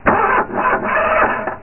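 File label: jeep start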